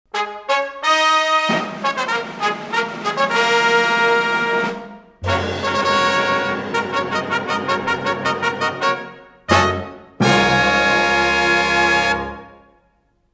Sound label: Open and End Fanfare; Ren & Stimpy